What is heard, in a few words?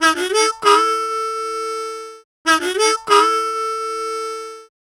Harm 098bpm A, Harmonica